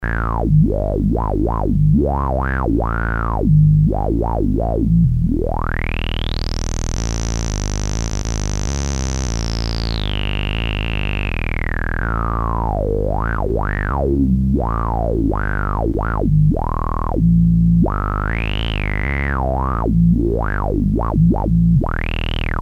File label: rez, synths paia